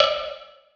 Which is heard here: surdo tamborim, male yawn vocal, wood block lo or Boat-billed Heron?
wood block lo